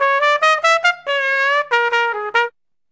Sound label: acid jazz trumpet; HORNS SAX TRUMPET BRASS